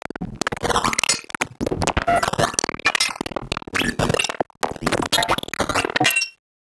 glitch

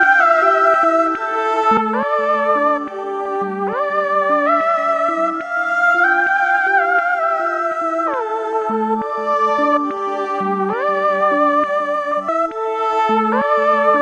synth loop, drum n bass

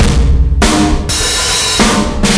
101 bpm, breaks; Zero-G Beneath the Planet of the Breaks

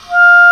Oboe p F4; Digital Sound Factory Virtuoso

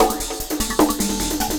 Break Beat, Drum N Bass

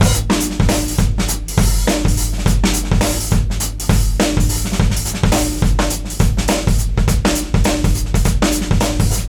DRUM 103

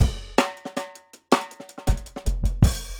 160 live jungle, breaks

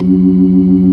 MID FAST, ToneWheel Organ; E-MU Formula 4000 Series Vol. 3; Analog Odyssey

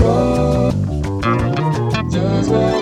come running, Loops; Polyend; Loops n trouble

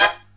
HI CHORD, Brass

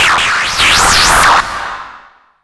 CARTOON, Hard & Loud Techno

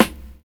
SD, Drums Dance & Groove; USB Soundscan vol.11; AKAI

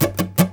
3 STROKE CHU, Nylon Guitar

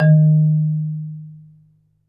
MARIMBA B; ILIO

